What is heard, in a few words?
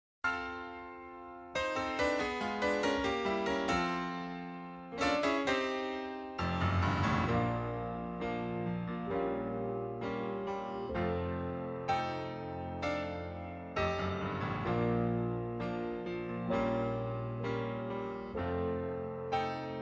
instrumental